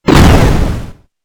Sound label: bolter old, space marine